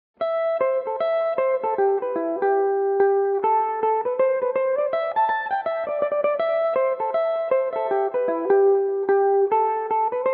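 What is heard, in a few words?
reel A dorian, irish mandolin, tunes; apples in winter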